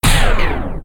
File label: digging, mechanical mining